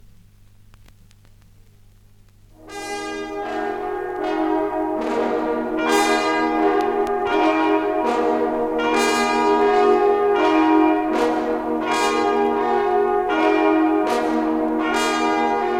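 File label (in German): Glocken und Glockenspiel, Jagdhornbläser; so blasen die esslinger jaeger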